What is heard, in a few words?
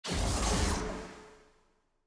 ui map click; viego